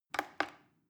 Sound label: plastic button little click regular slow